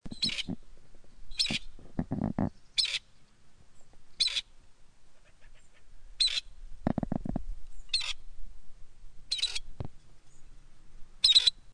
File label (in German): rebhuhn